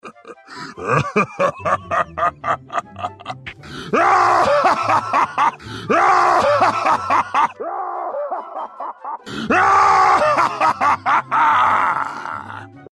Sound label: hahaha